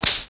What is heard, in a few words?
HORSE RAC; Canon CameraWindow